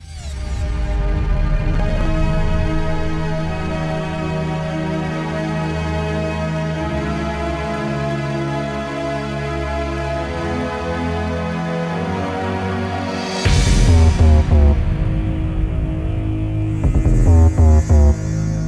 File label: Airbass; Riddle School 4